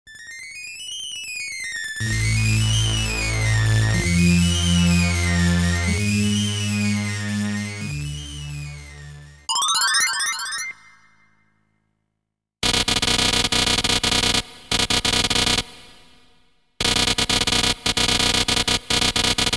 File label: TITLE ~ COIN; PREHISTORIC ISLE